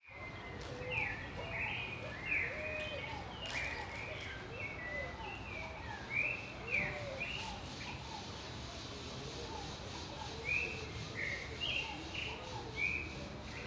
Rolinhas e Outros Passaros em Quadra Residencial de Brasilia; aves

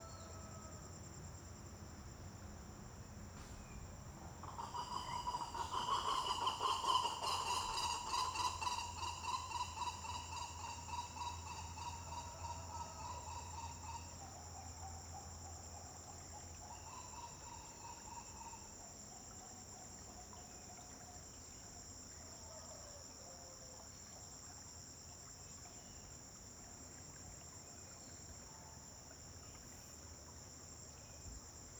Ave passa cantando; aves

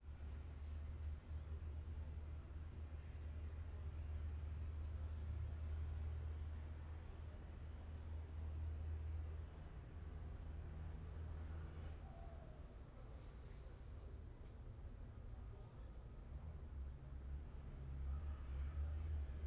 Ambiencia em Loja Comercial do Paranoa com Portas Fechadas e Transito na; rua

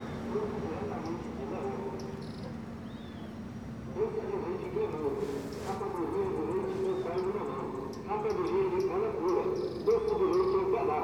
Carro do doce passando no parque com passaros e alguns passos

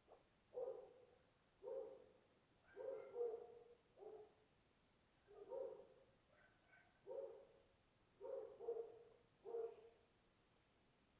Ambiencia cidade pequena a noite com cachorros e morcego

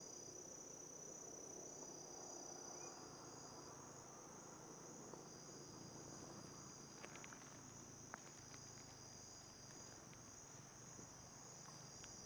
Motos passando; terra ronca

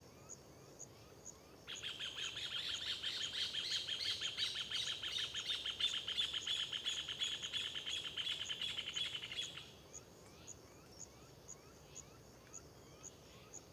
Joao de barro no parque terra ronca ao amanhecer com grilo e outros passar; aves

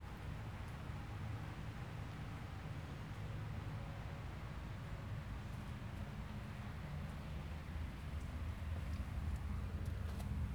Ambiencia Apartamento Noite com ruído de transito ao fundo; ambiencia domestica

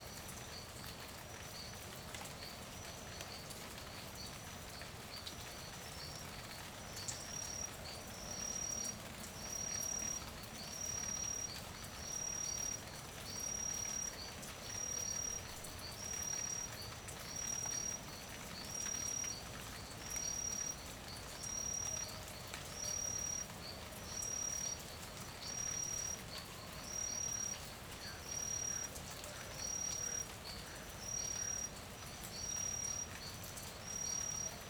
Chuva fraca na chacara a noite gotejar, alguns grilos distintos, galo bem; longe

